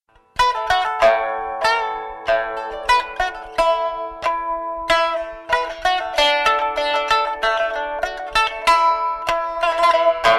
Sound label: Chinese Pipa